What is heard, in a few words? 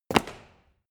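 step land reverb, footsteps; schritte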